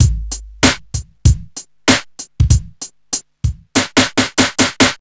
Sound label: 96 bpm drum beat A key; drum loop